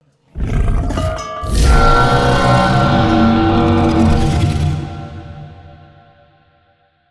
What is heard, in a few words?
Corrupted Vision End Roar; Cinematics; Bromius